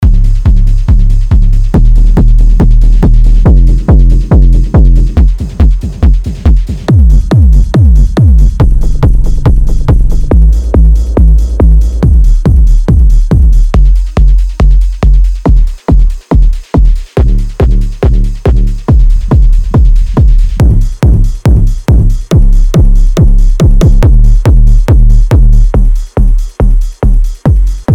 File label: techno kicks; revealed